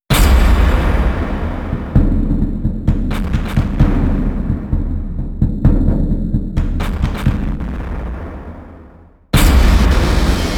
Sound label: blood no vox no chants